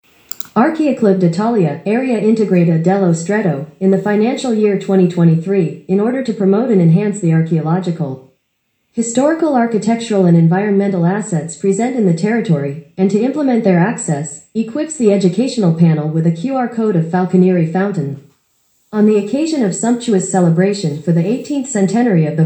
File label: English vocal version; FALCONIERI FOUNTAIN